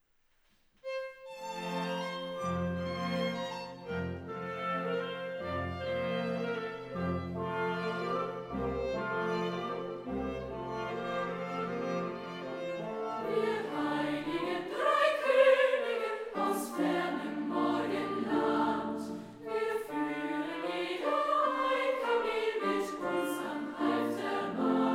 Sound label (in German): Gesang der Heiligen Drei Könige, Chormusik der Romantik